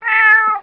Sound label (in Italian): gatto